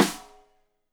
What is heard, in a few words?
Rim Shot Stereo; Deeply Sampled Drums; SMDrum